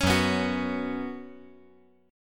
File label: Gb Suspended 4th Gb, down